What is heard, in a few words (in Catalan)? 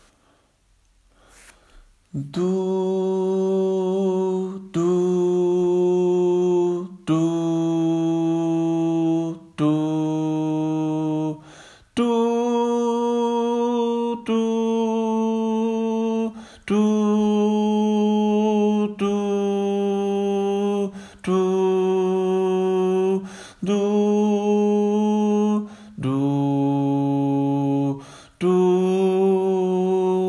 Cançó de mar BAJOS